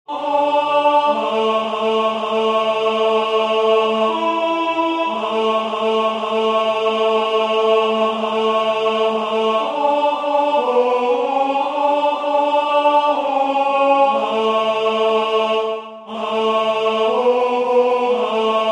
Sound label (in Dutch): Tenor, koor